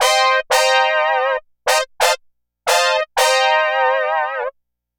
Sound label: Disco Brass; Dr. Fink's Funk Factory